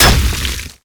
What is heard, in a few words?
weapon axe leap